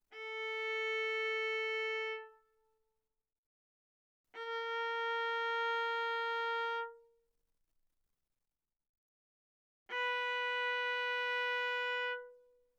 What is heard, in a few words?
Viola arco sul A ff A4 B4